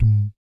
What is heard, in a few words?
BASS DUM; Spectrasonics; Vocal Planet; Jazz FX